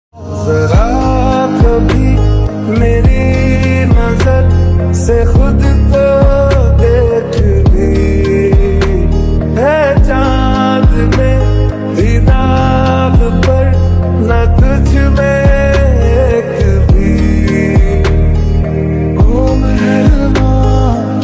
ringtone

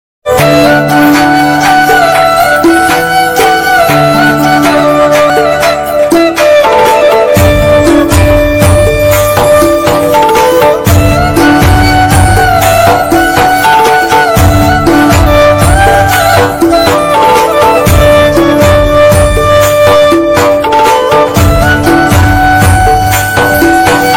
radha krishna flute ringtone

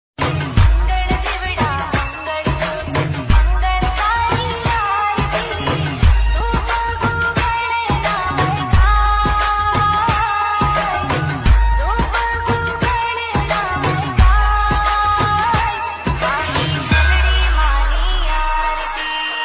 meldi maa ni aarti ringtone

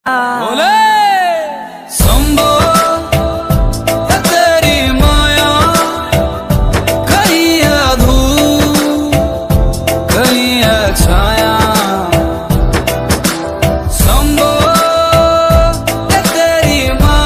Shankar bholenath ringtone

